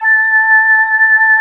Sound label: SYNTHY F